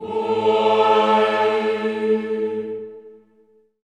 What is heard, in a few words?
CHO, Mix-Combi; Sound & Vision - Gigapack I CD 2 (Roland); Combinations